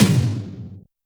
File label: Tom; Roland R-70